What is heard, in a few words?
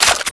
hk416 mag tap, weapons; cso